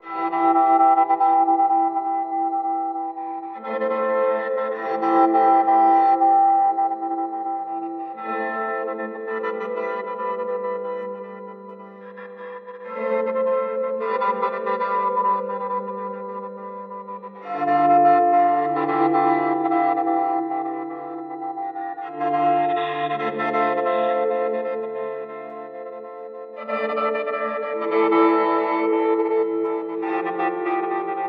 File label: Walrus Audio R1 BFRCT